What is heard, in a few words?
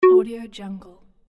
button